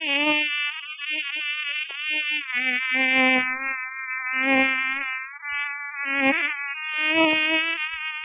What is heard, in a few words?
mosquito; Carnage Contest